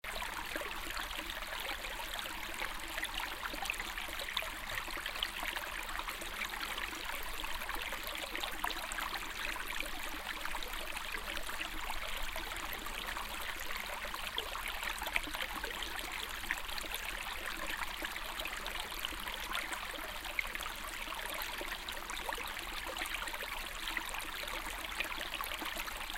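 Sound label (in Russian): устье реки вода течет в реке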